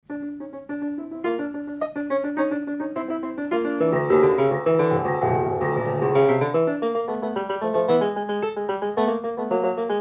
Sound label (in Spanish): Tres estudios para piano